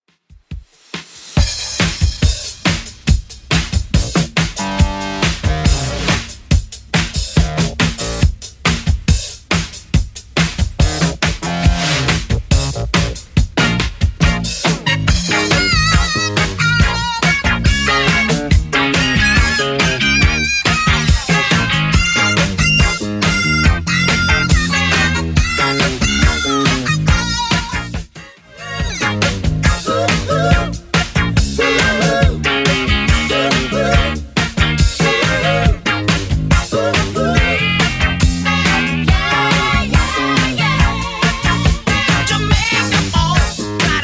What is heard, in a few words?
70s Redrum 140bpm